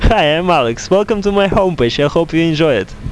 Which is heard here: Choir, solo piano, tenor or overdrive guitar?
tenor